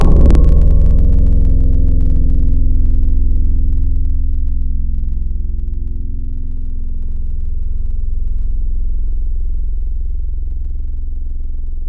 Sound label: Knock, Tropical Mallets; Synthetic's Serum Collection; SparkPackers